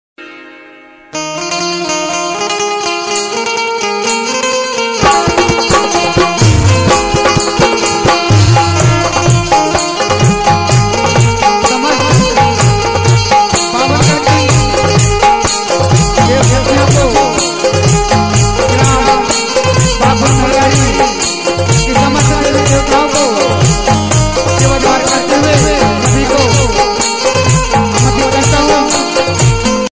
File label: gondi ringtone gondwana song ringtone